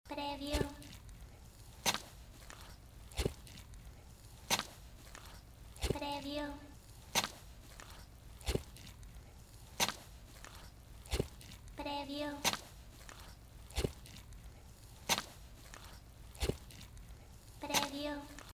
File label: Mud Walking Loop